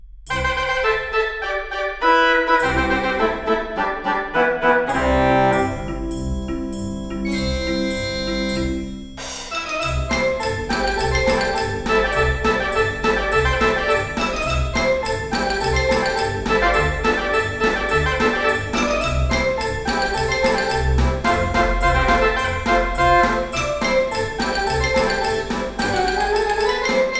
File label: reverb